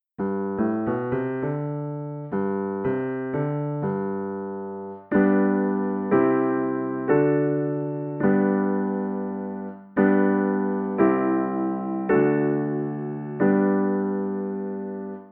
Key of G I IV V I

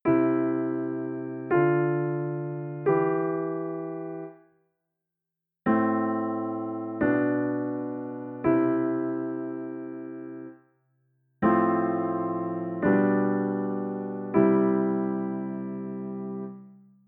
soprano bass

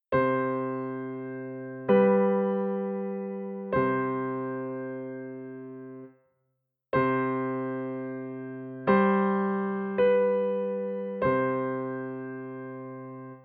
Delayed leading tone

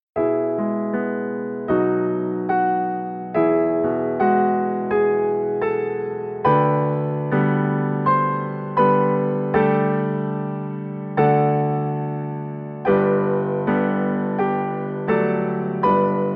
Mediant modulation

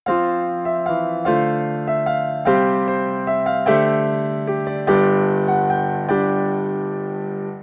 Whole step modulation F to G

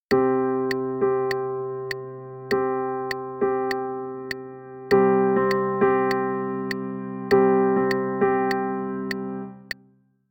Divided push

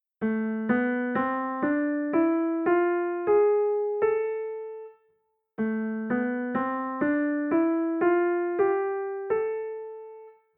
Harmonic and natural minor

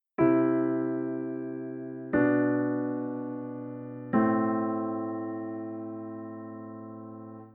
soprano and bass

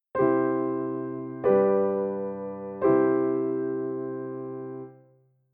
C G C shape

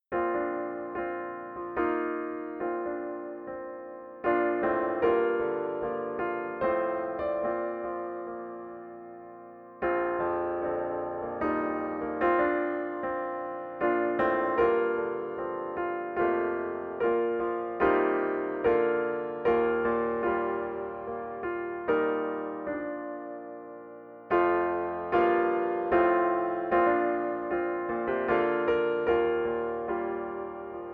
solo

